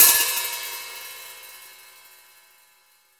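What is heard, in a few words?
MIX open hi-hat, OLD HH; AKAI S-Series CD-ROM Sound Library VOL-3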